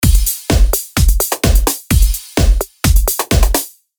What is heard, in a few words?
Drum Loop